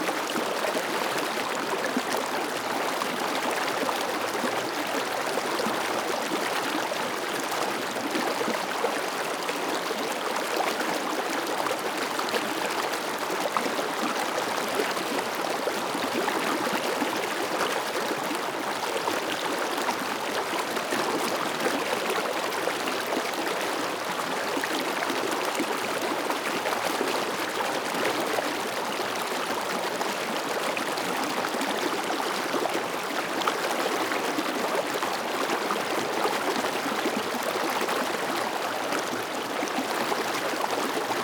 River near field, Mountain rivers and streams; LOOP; Ivo Vicic

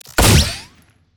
WEAPON GUN GUNSHOT FUTURISTIC; SCI-FI Gunnery; PMSFX

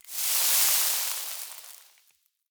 CHEMICAL ACID Sizzle, Burn, Short; Magic Elements vol.2; Articulated Sounds

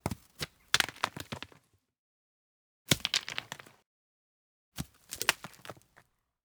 ROCKS Single Rock Impact on Stones; Tatak Audio; Rocks and Debris

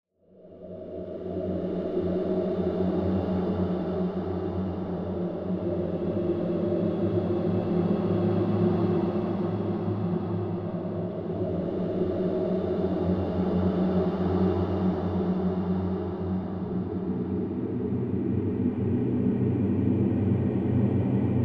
PAD Dangerous Air, Dark Textures; SmartSoundFX

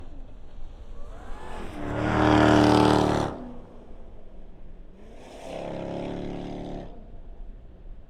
EXT Passby, Jaguar XE SV Project 8; Stereo XY; Pursuit Sound

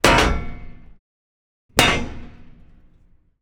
Hotchkiss H39, Engine Cover Movement; Pole Position; MKH8040

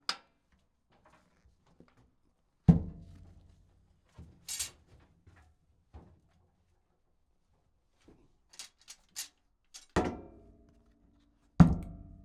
Centurion Tank Loaders Hatch Open Half Full; Centurion Mk V2 1955; Pole Position

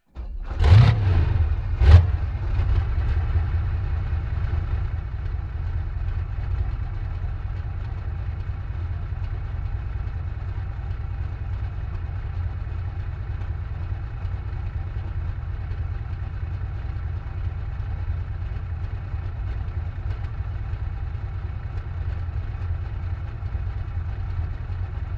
TANK T-44 Exhaust Pipe Start Idle Stop; FLYSOUND

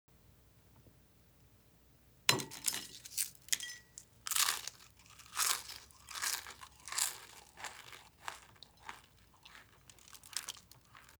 CHIP GRAB AND EAT CHILD, Eats and Drinks; Ultimate Eats and Drinks

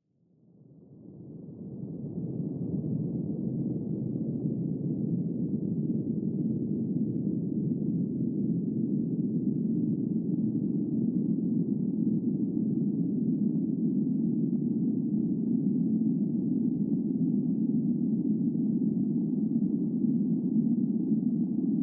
boiling pot; STRANGE WORLDS 3; Leslie Reid

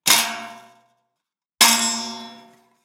Metal Case Detuned Boing Spring; Mechanical Wave; Foley Session 01

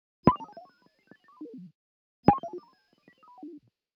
UI Back; Kpow Sounds; UI SOUNDPACKS

